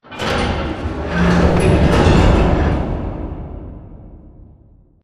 Lower Arm Second House Grab; Boomtown; Sideways Town; Titanfall 2

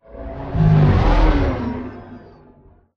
deploy from mega; Titanfall 2

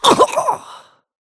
grunt death neck; Titanfall 2

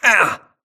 grunt hits; Titanfall 2

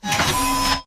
Stalker Mvmt Servo Damaged Light Medium; Titanfall 2

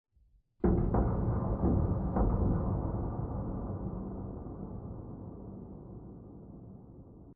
Metal Stress; Boomtown; Sideways Town; Titanfall 2

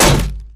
bullet heavyballistic titan; Titanfall 2

